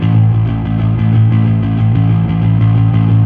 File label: Fuzz A3; Torchlight III; PS4